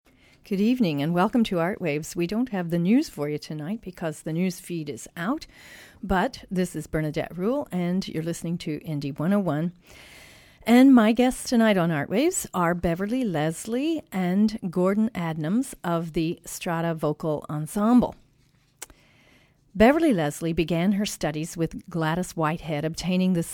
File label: Strata Vocal Ensemble; Art Waves